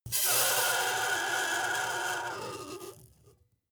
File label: metal screech phaser steady short